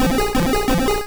Lick; Pokemon; Gen 1; attack moves; RBY

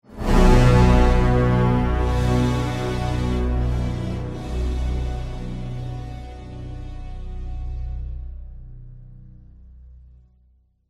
Game Stinger Failure

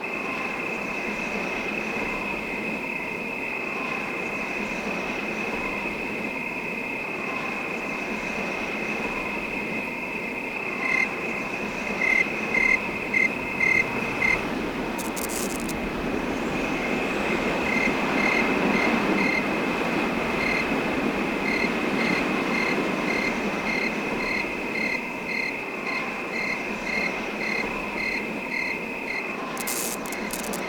Rooftop Ambience; Monster Madness

